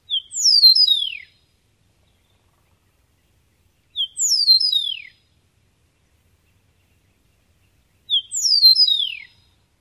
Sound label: song